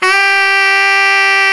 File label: SAX T GROW; Tenor V-sw; Tenor 4way; Roland LCDP07 Super Sax